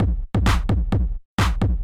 drums

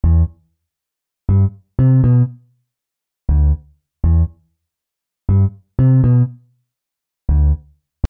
bassline

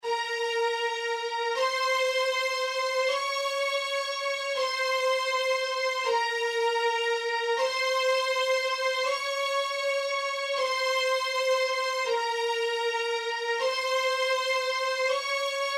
80 bpm strings

